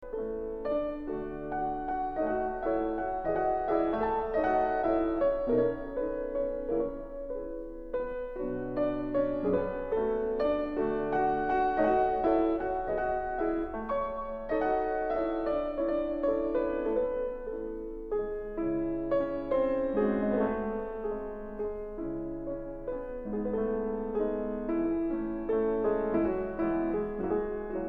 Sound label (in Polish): Mazurek